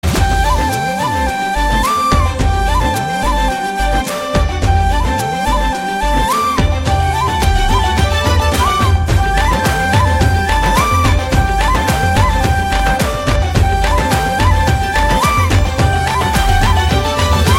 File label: Theme Telugu Ringtone